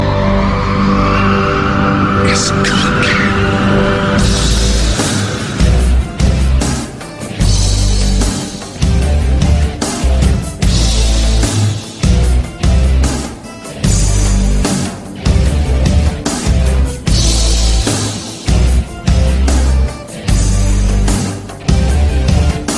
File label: rock ringtone; hollywood heel; english ringtones